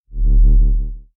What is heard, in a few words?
LFO Thrum; A Robot Named Fight